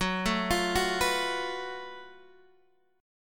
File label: F sharp Minor Major 7th Double Flat 5th, Guitar, Half Step Down